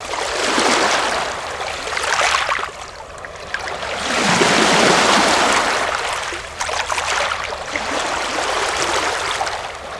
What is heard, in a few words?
Waves; gnarled forest